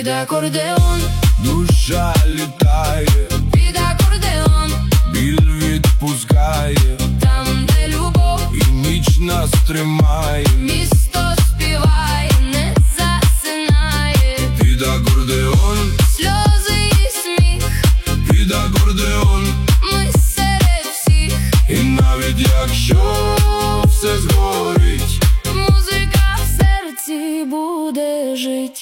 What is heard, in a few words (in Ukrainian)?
Pid akordeon